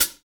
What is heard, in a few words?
hat rock, Hi-Hats; Roland L-CDX-01; HH Modules